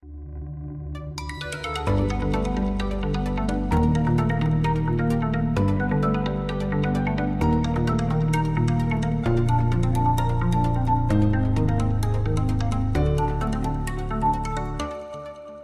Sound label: comic music